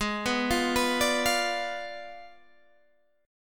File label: G sharp Diminished 7th G sharp, Guitar Open G